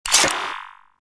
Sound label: button; Star Wars Jedi Knight II Jedi Outcast; XBOX; switches